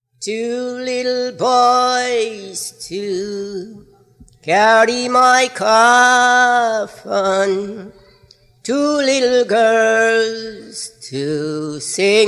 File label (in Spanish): Canto funebre, musica afrocaribena; himnos religiosos y marchas funebres